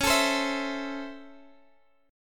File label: Db Minor Major 7th Sharp 5th, Guitar, Standard; x,x,11,14,13,12; strummed